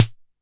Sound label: Snare; Ableton Live 12 Lite Core Library